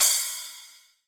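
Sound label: Cym 10 inch Splash, Alesis D4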